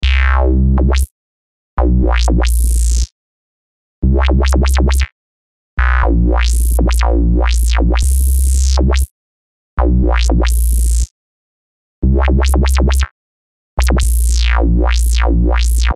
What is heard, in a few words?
dubstep drum n bass bass, lfo rate modulation; ableton